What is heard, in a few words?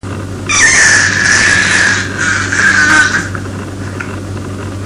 squeek, baby; 7 mnths